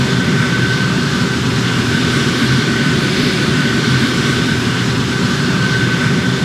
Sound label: jet side, engines; War Thunder